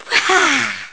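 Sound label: Drink, gameplay sounds; Link drinking a potion; zelda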